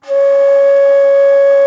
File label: T. BONSURI, India Winds